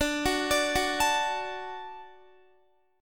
D-Major, D, Guitar, 4F, 4A, 5C, 5G, 5C, 6E; arpeggiated; x,17,18,19,18,17; chord

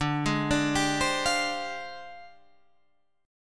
F Minor 6th Add 9th, guitar chord

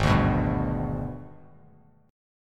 G sharp Minor Major 7th Flat 5th, Bass Standard; strummed; JGuitar

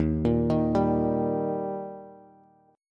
Eb Major Eb down Bass; arpeggiated; JGuitar

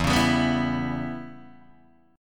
E Minor 6th guitar chord, Open D

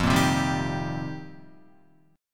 F 7th Suspended 2nd Suspended 4th, Guitar

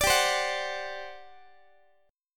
G Major 7th Suspended 4th G, Guitar; GM7sus4 strummed

